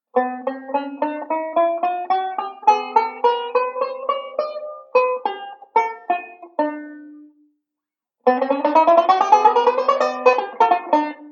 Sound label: Chromatic Run, chord melody; Beyond Chord Melody Volume II